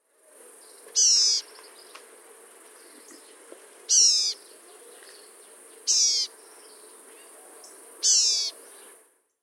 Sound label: mew; Downs 5; El Pedregal MX